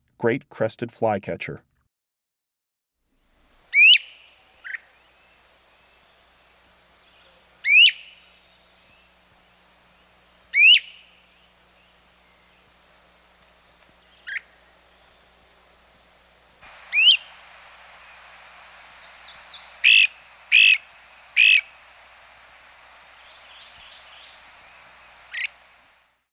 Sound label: Great-crested Flycatcher, Birds of the Chicago Region